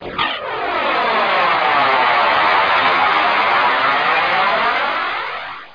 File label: baseball; Museum of Anything Goes